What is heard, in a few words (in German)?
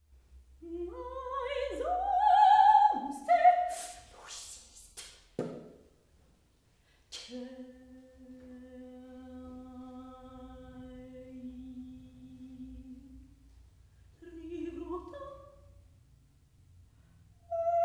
Stimmen, Silben